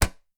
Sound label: sling metal, attack impact